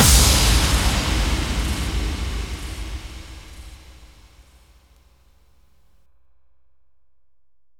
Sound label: Bassdrums FX, FX Kicks; Vengeance Samples